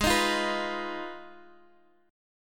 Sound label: F Minor 7th Flat 5th, A flat